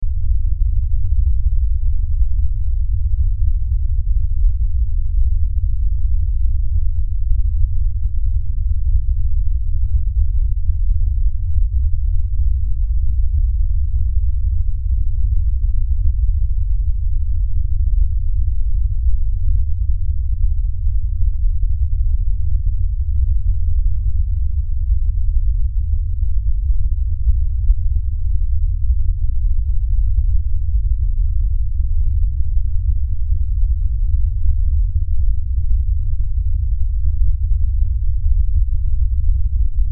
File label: LFE Rumble, Lfe Rumble Low Bass; Rumbles; Blastwave; Sound Effects:Sound Design